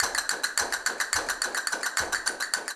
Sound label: Castanets, Flamenco